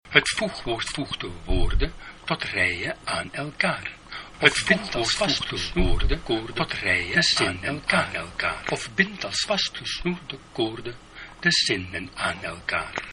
nederlands voegwoord canon

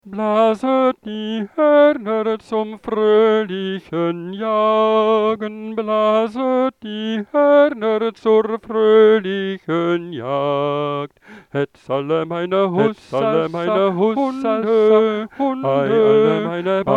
herfstliederen blaset die horner zang tweestemmig canon

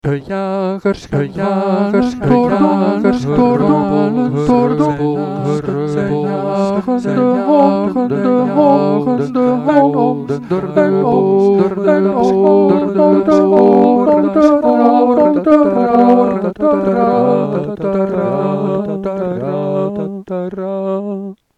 herfstliederen de jagers gaan dwalend zang driestemmig canon

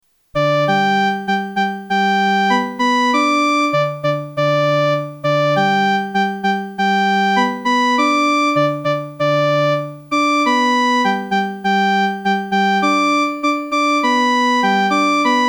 herfstliederen de jagers gaan dwalend instrument eenstemmig

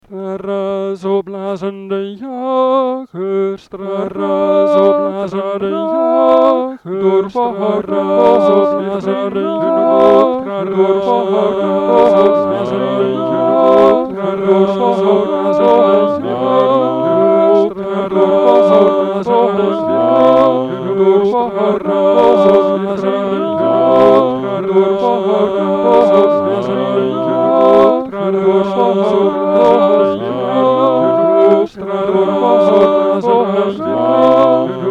muziek herfstliederen trara zo blazen de jagers zang vierstemmig canon

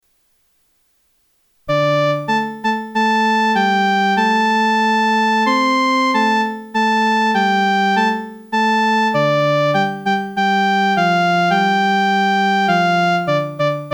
frans lied colchiques dans les pres instrument eenstemmig

